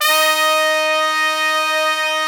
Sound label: D#3, Section Horns